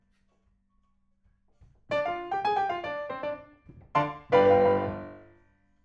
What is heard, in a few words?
PIANO